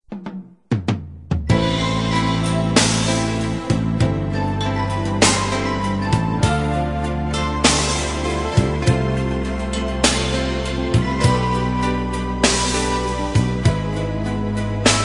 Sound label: Samba